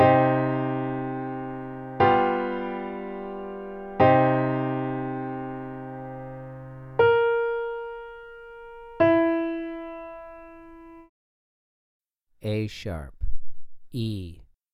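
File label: 2 Note Melody Minor a#3 e3, piano; ear training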